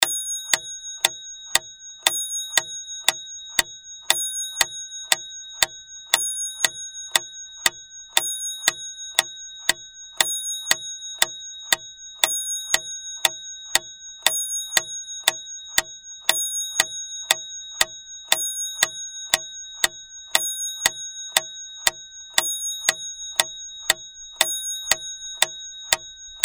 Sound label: metronome